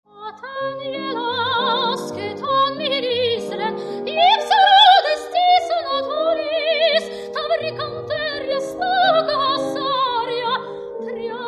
vocal miniatures

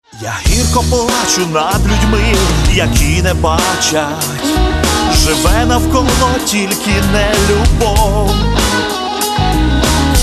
Karpatskyi Chardash

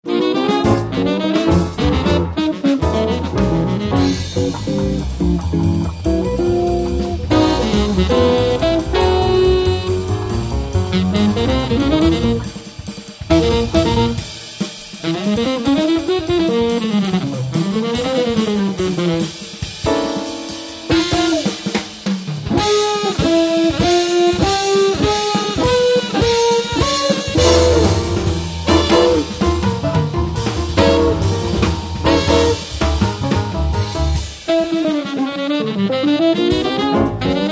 guitar studio

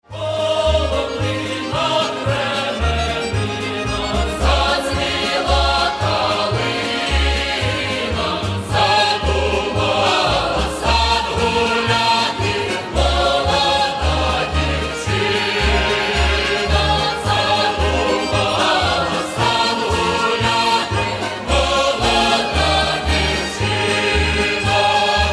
Folk Choir; Cherkasy

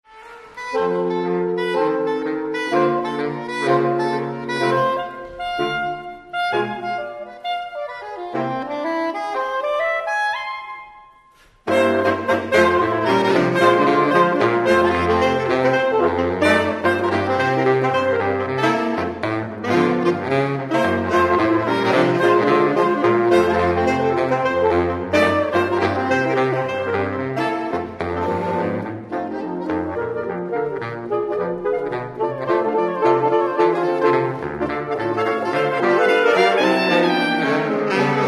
sax classics jazz

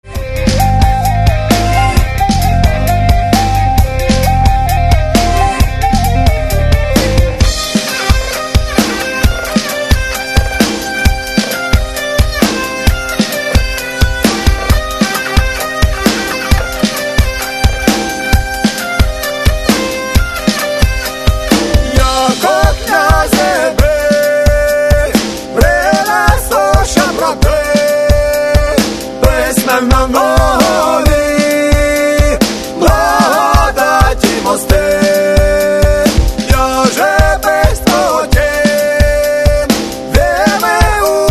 rock

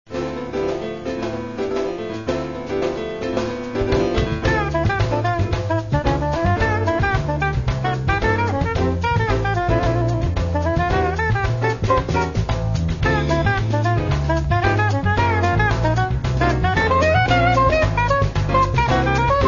jazz; ukr jazz

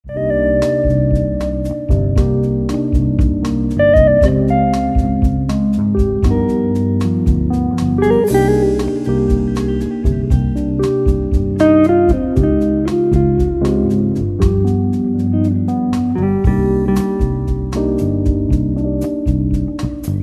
Jazz

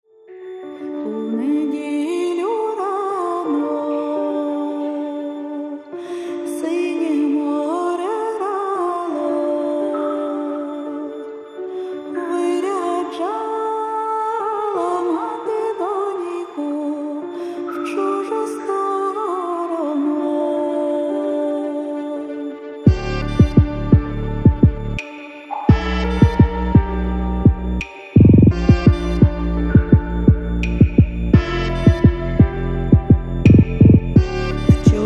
Blues

